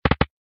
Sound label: REELSET STOP; Golden Reel